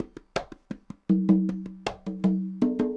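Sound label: conga bongos, latin, 80bpm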